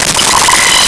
Arcade Power Up; Arcade and Games

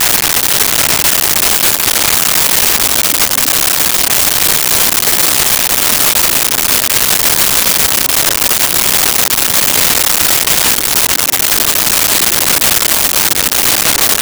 School Hallway Interior; Backgrounds; SONY PICTURES SOUND EFFECTS SERIES

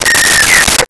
Walkie Talkie Feedback; Communications

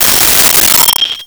Bell, Metal

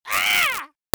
Man imitating crow; Animals

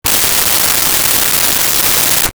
hairspray; Bathroom

